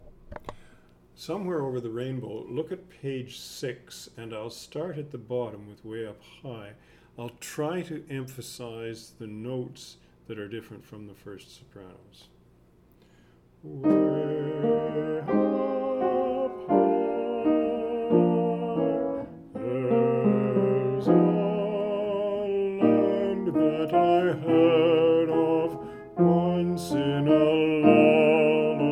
soprano, choir

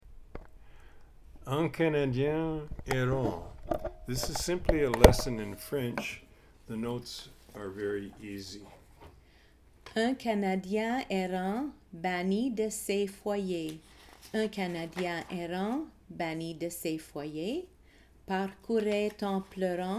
alto, choir

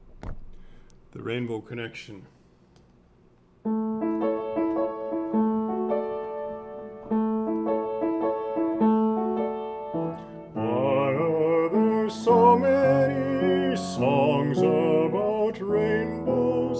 tenor; choir

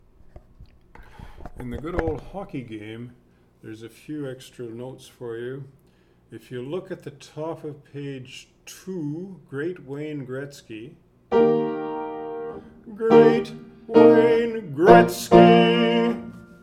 soprano

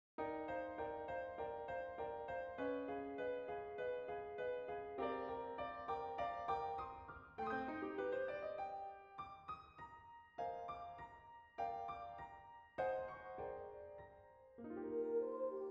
unison, choir

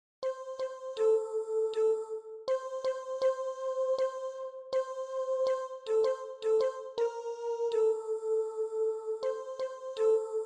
african alleluia soprano; choir

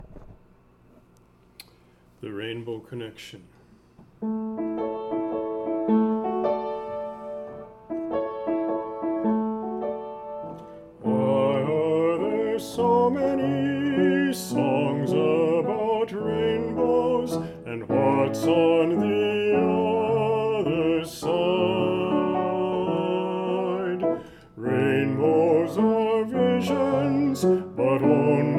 bass; choir

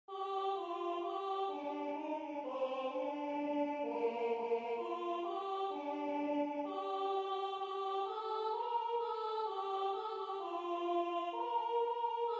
unison, choir

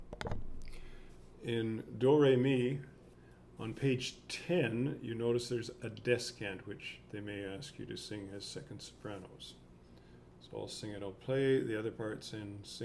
soprano 2; choir

